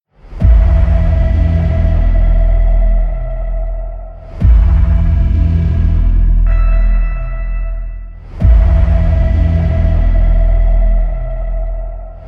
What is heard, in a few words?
Epic Music instrumental